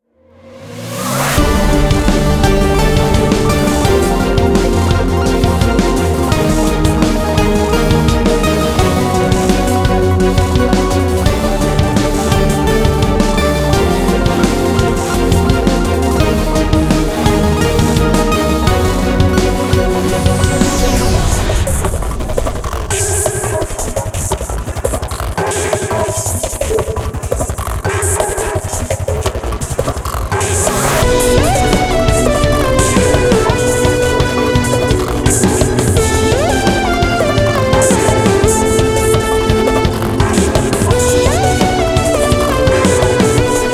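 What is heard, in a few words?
background music dungeon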